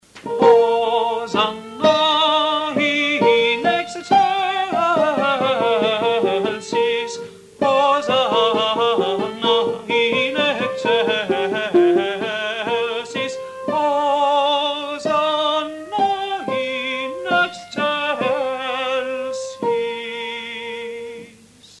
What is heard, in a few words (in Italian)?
Requiem Benedictus soprani; Mozart